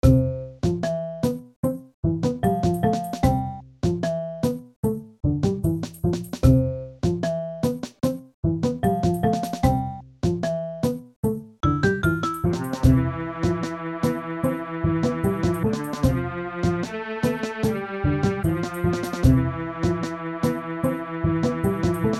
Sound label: rounded hills